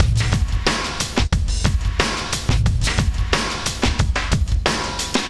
Club Tip, R&B Drums

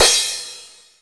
Crash, Jomox Xbase-09

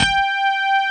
HARD RHODES; Pro-Rec - Synthsonic

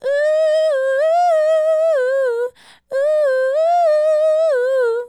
Female Solo, Hip-Hop and RnB Vocals

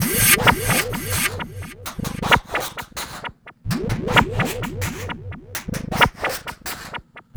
NRG SFX, Trance; Sonic Foundry (Sony Creative Software); Trance NRG VOL-1

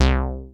Analogue hits and octaves; FM 57 - The Ultimate Sample Collection (1997)

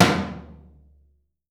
TOM 3H R, DRUMKIT

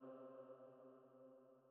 Female Pad C2

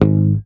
HAMER MUTES, Bass

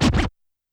Scratch L, YAMAHA RM1X

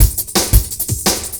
Beat, Futurist Drum & Bass; Drums & Percussion

